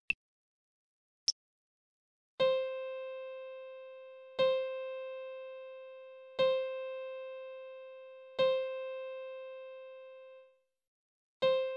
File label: c piano